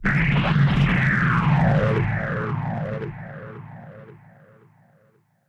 Anime Super Blast

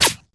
Bullet Flyby; SOUNDSCRATE

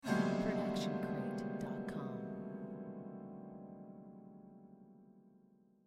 piano strum

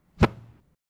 block from hit

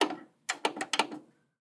VHS buttons; SoundsCrate

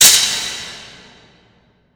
designer hi metal hit; SoundsCrate

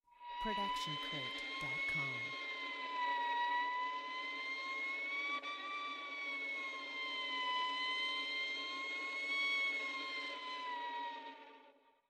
Violin Harmonics Long

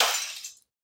Glass Break; SoundsCrate

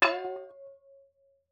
metal bowl ding; soundscrate

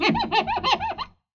squeaky shine polish; soundscrate

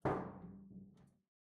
Metal Footfall; soundscrate

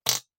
Fart Noise; SoundsCrate

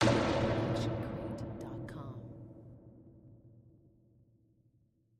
designer helicopter hit; SoundsCrate

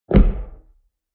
footfall boot slam; soundscrate

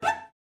Cello Psycho Screech; SoundsCrate

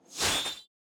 Distorted Metal Scrape; SoundsCrate

